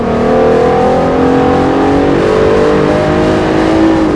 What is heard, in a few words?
third, vehicles